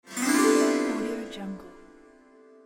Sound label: harp glissando ascending